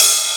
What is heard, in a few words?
Hats Electro Open